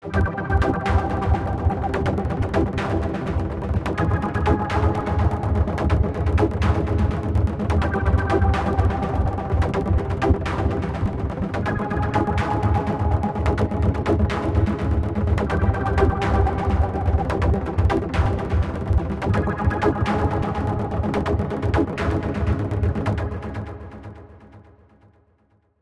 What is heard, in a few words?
Running Music